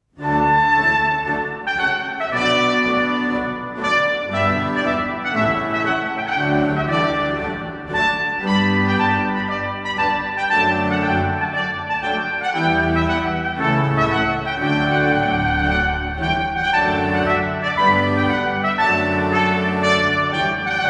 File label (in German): Trompete Orgel